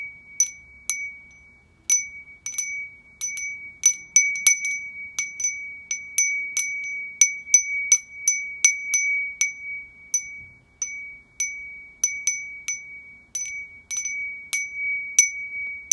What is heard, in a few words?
Glass Bell